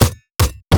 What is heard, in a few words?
bear basicattack; LoL; annie base